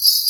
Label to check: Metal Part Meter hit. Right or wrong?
Wrong